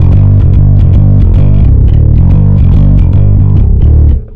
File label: Bass, Distorted G; Bass X